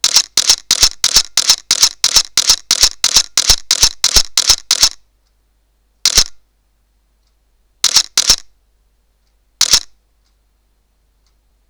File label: Sony Alpha DSLR-A850 continuous XFINE JPEG